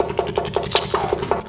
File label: Loop, Drum & Bass, 160 BPM